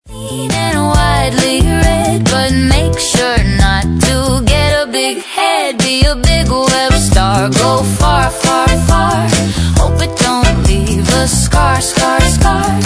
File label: Indie G Funk